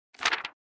Personal diary page turn

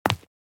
High heels single step on street

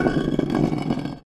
Battle axe scrapes against stone

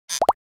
Designed pop up

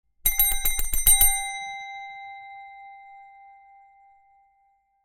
Multiple impatient rings on reception desk bell

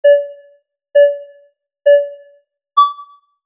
Racing game start countdown; mobile game

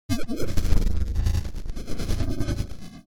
Robot malfunction or power down

